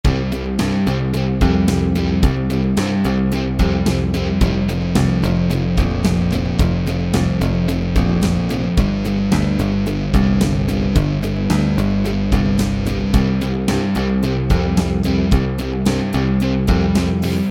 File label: hardrock